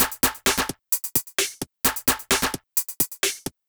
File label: Beat 130BPM (Drums No Kick), techno; techno drum fx